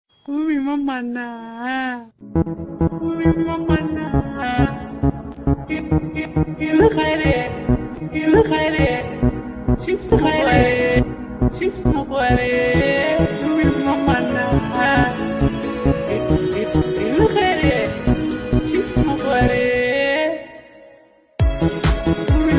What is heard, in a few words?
remix; music tanze khandedar